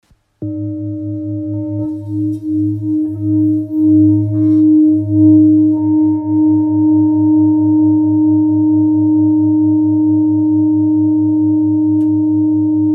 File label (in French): Bol full moon fa